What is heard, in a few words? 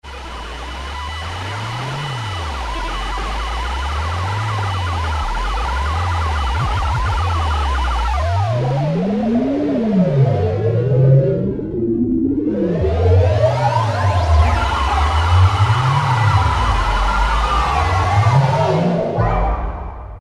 Hyperspace, Alesis QS8; Factory Preset examples